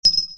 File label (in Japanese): fall suzu